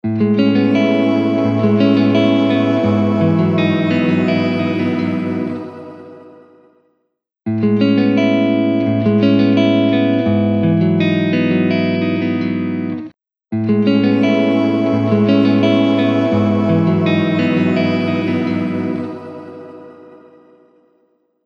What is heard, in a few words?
Guitar, Electric Guitar; Preset: Voice on Ice